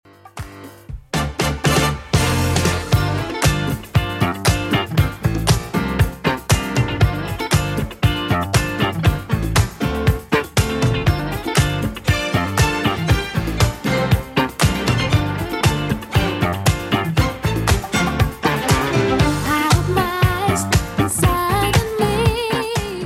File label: 80s Redrum 118 bpm CLEAN